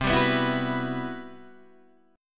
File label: C-Major Sharp 11th, Guitar DADGAD